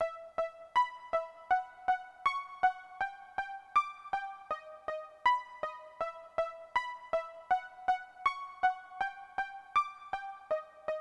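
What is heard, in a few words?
080 Wave Synth Pizz E major; Pop Rock; Digidesign; Pro Tools; Pro Tools Essential Loops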